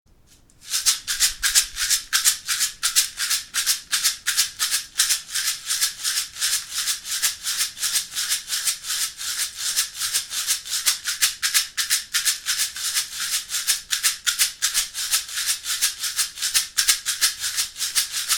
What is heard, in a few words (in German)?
rassel, schamanische rasseln